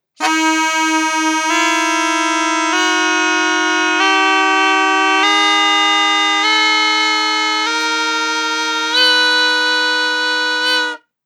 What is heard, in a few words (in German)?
Pungi Skala; Instrumentensammlung; Lo20 Pungi